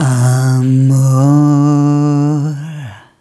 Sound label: Rhythmic D